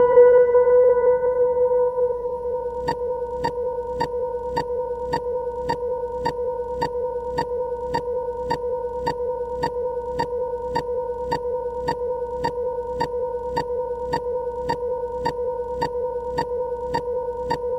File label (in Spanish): Sonidos Guit